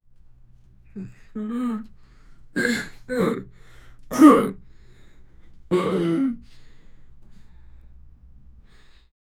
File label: adult man in pain, wince